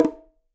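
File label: Bongo Low Flam; Bongo A L Flam 1F; Latin Percussion; Drums; Drum Hits; Ableton; Factory Packs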